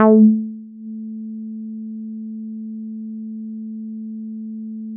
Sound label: Synths BASS